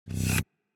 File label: Hologram portal opening closing